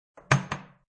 Glss On Cntr, Glass placed onto marble countertop.; Glass Sound Effects